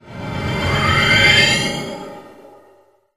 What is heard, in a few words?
warp